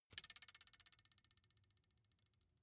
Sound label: thin metal boing vibrate